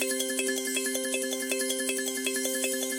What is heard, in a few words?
countuploop; serengetikings